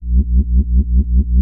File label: throb